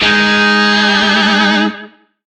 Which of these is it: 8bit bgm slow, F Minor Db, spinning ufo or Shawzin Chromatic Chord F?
Shawzin Chromatic Chord F